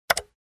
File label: Keyboard enter key press